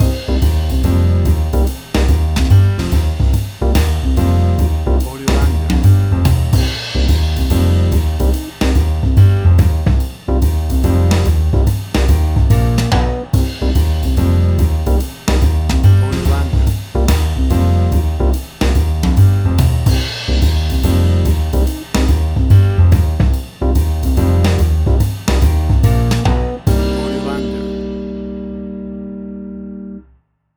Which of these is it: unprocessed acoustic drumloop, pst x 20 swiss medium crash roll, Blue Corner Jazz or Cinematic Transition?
Blue Corner Jazz